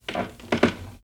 walk wood creaky

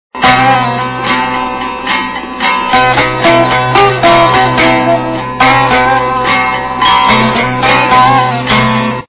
Background Music; Bin Laden Liquors

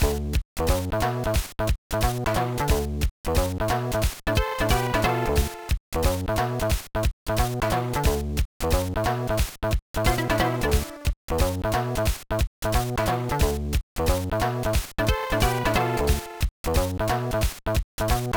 BGM; Angel Collection Mezase Gakuen no Fashion Leader; gba

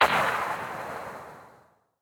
Explosion Tail; boomerang fu

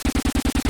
Slots Loop; castle of no escape 2

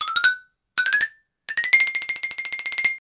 Scrolling Scorpion

